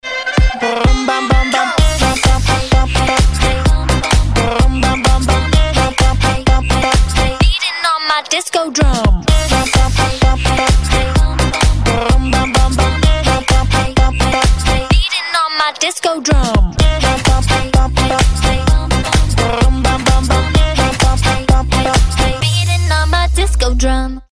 disco drum

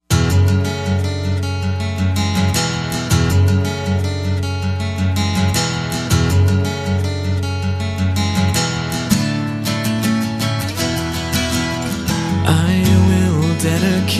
acoustic

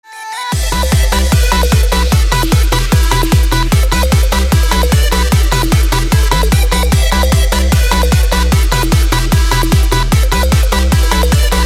hard edm workout 150 bpm

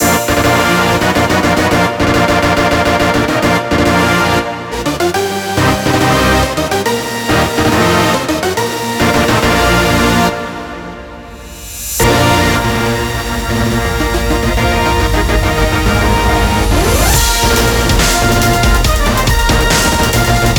dubstep remix

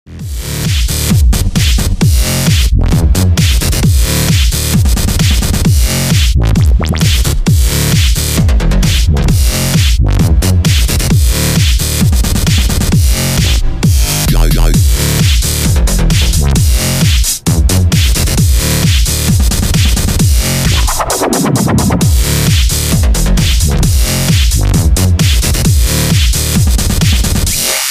electro dance

